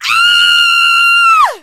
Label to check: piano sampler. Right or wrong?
Wrong